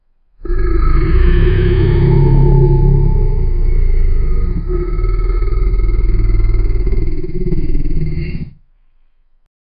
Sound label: a dinosaur furious roarin